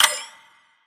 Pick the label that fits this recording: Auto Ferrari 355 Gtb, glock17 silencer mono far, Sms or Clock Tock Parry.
Clock Tock Parry